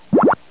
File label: coin